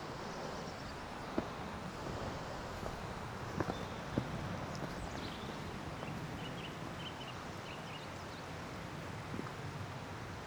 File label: woodland birds